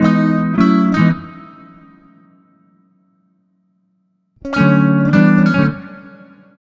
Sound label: Multi Guitar Bossa Gtr-E, 105; Zero-G Kocktail Kollection; Construction Kitz (Lounge)